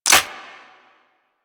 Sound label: Rifle Charged Mech; Warframe; Infested; Quanta